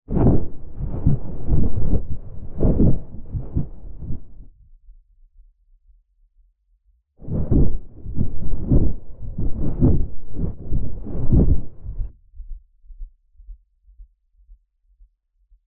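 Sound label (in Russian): громы создаваемые движением обработанной ткани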